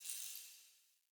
Healing Totem Small Flower; Fey; Ward

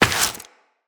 Step Rock, Footsteps, Walk; Tenno

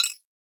Comp Beep; Ambience; Warframe; Tenno Hub

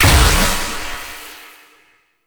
Shock Activate; Warframe; Eximus

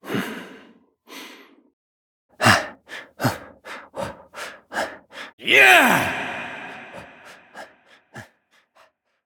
Breaths; Amir; Warframe; NineteenNinetyNine; Stage12PartyOfALifetime

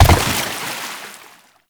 Land Water; Movement; Warframe; Uriel

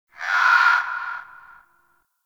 Night Bird Screech; Ambience; Warframe; Eidolon Plains

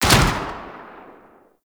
Rifle Shot Alt; Warframe; Tenno; Sundial Rifle

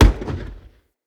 Bow Ranged Arrow Shatter; Weapons; Envoy Hunter Bow

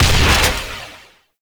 Shot; Warframe; Corvas Prime

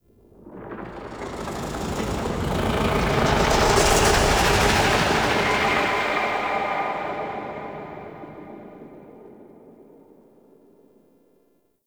Pulsating Whoosh; Warframe; Ambience; Entrati

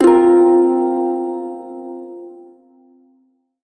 Prime Shawzin Hirajoshi Chord, Music; Warframe

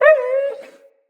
Wolf Cub Trapped Call Far; Animals; Wolf Cubs; Orengal Wolf Cub C

